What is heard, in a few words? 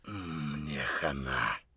hurt neardeath; Escape from Tarkov